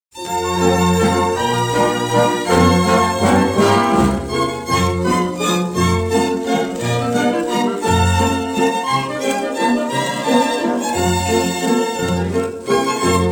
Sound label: Dutch Foursome - Pays-Bas